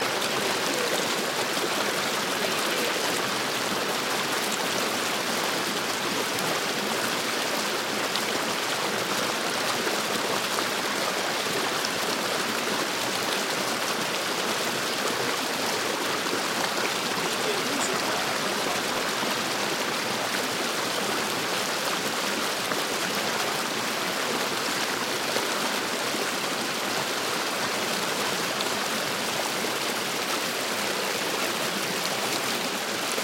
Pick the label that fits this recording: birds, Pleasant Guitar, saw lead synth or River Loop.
River Loop